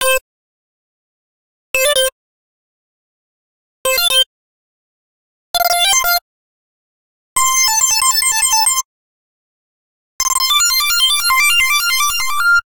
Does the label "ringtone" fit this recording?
Yes